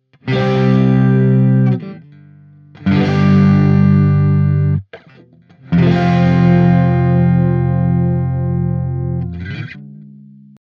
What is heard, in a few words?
Strato Marshall